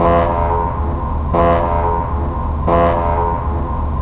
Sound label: panic event; svencoop; turretfortress